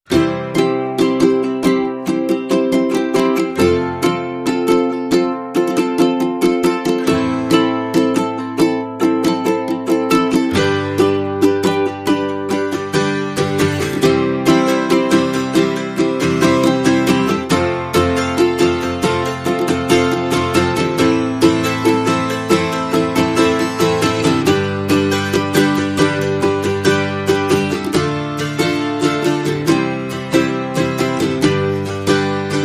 Ukulele Mix

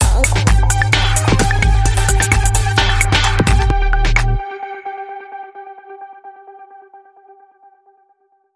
Sting

